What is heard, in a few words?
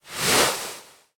Beanbag Sit; Sittable